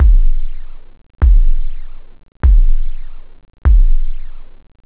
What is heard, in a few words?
stomper, drums technobd